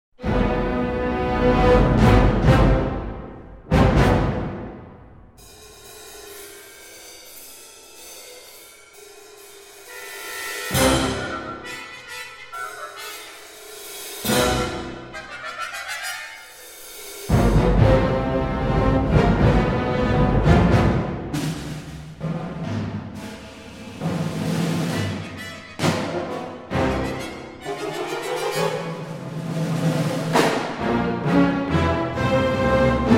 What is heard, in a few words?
PERCUSSION CONCERTO